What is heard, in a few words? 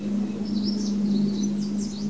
garden